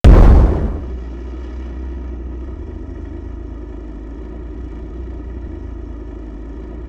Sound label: Aircrafts Compressor Stall In; DCS